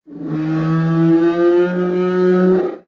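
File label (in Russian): korotkiy rezkiy ryk dikogo zverya; jivotnyie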